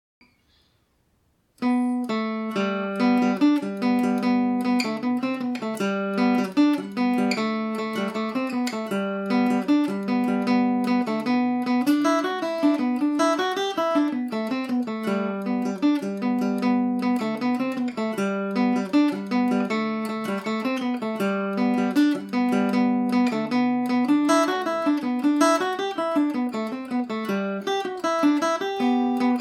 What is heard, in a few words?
miss mcleods gmaj, irish mandolin